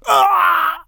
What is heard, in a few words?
Enemy Generic Human Death Crushed